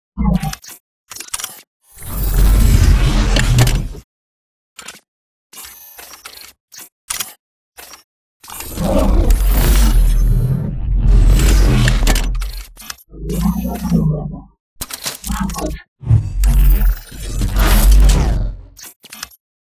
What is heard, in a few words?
Casual Idle; Titanfall 2